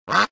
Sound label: duck quack; DuckShooter